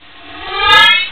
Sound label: swish lasersword lo 2 hi